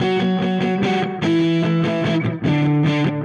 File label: Dirty Chunk Guitar, Indie Rock; Silos; Sonic Foundry (Sony Creative Software); Indie Rock Anthems; Session 11 - Silos